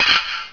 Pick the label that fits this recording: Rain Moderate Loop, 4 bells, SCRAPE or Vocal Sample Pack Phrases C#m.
SCRAPE